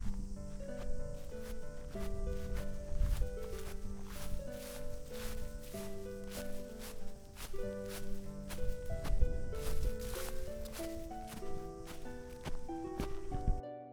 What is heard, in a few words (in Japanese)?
草の上を歩く